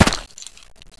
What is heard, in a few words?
player dirt; xash3d fwgs